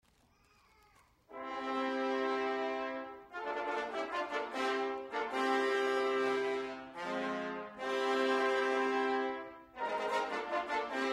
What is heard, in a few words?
Fanfare; 2009 concert